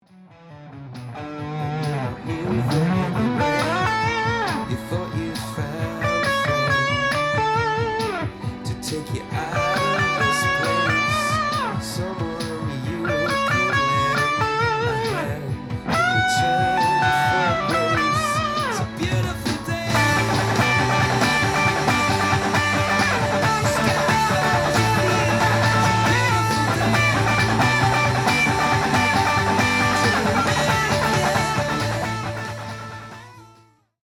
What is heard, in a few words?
beat, guitar